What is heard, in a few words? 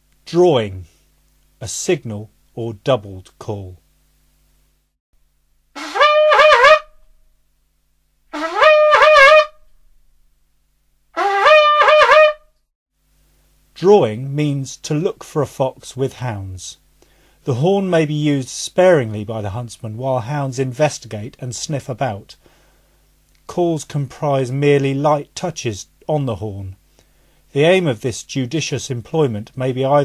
DRAWING, calls